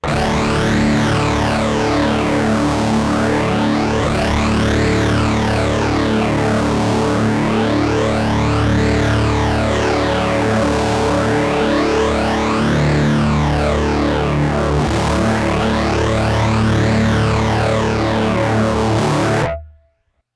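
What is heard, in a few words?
Rat guitar, Moogerphaser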